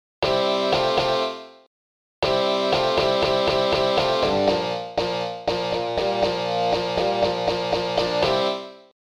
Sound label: Intro Riff